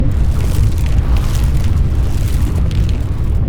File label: brutal strikes; LoL; glacial malphite